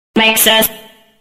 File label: vault hit stinger; sinners